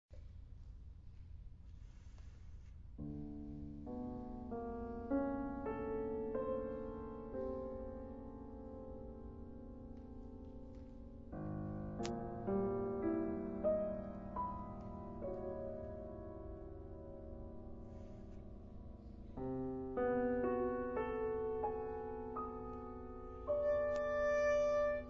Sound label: saxsonata